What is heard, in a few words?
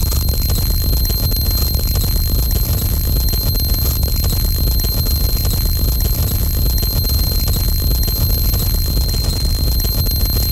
tinnitus; Barotrauma; Real Sonar